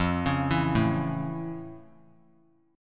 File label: F Augmented Major 7th F; F+M7 arpeggiated; JGuitar